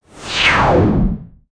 strike with a magical, blade

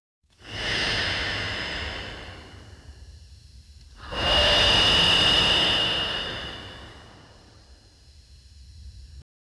iron diver breathing; athletic

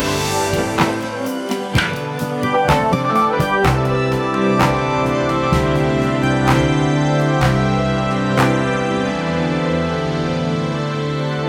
playful music starts

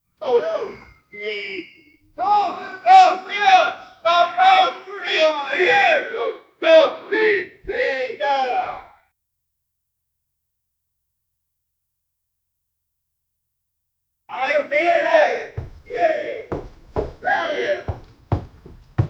old man falls down

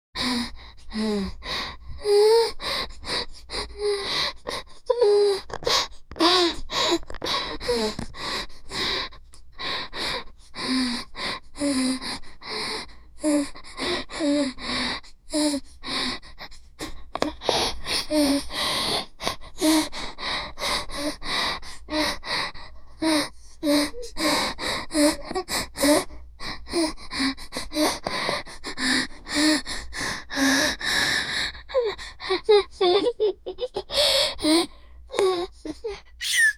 a little girl grunting an

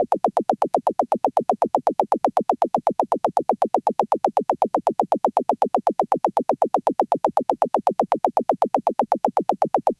create a percussive atonal, atonal

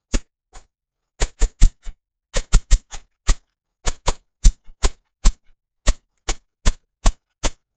fighting, close combat

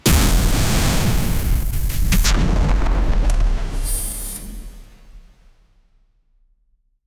spins into a flurry of, radiant

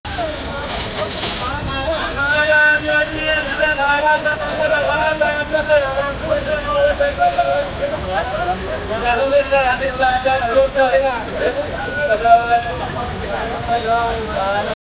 indian market background

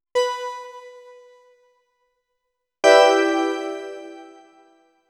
a little brink with a, synthwave

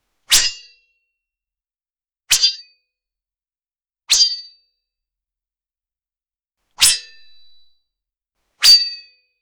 the sword slash sounds cl, melee

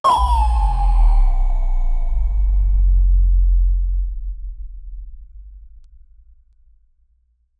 in game clear legendary item

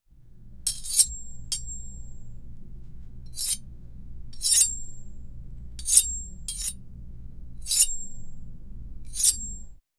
blades moving sound, melee

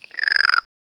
loud giant forest frog cr

